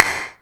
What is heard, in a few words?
CLAPS; CLAPS+SNIPS